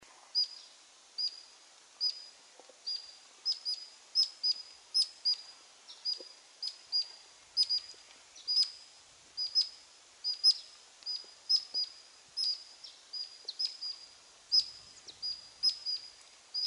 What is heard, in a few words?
giant hummingbird